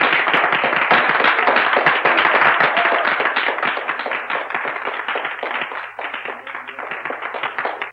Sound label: Clapping; Movie Bits